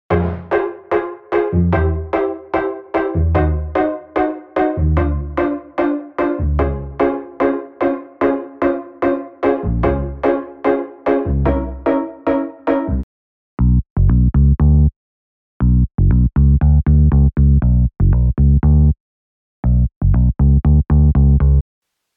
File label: Pop & Future Pop; unisynth